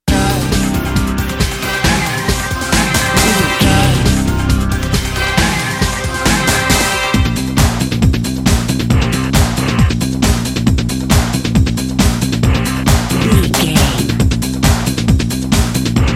Dance, EDM, Club, Disco, Eurodance, Confident, Energetic, Cheerful, Happy; Exercise, Aerobic, Aerobics, Spinning, Workout